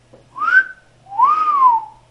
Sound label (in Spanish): silbato